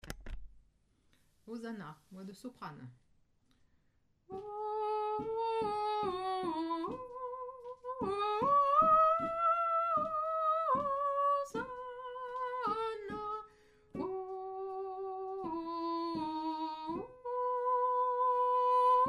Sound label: hosanna soprano; repetition agen septembre 2024